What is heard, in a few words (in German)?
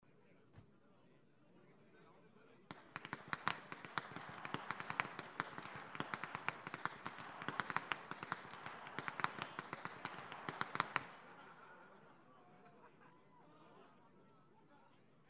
schnalzen, flachgau; LIEFERING II